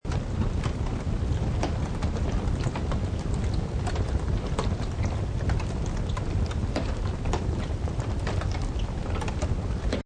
Fire General Fire Loop, Continuous fire burning in building or vehicle, general fire crackling; Continuous fire burning in building or vehicle, general fire crackling and popping with medium flames. Seamless loop; Lynne Music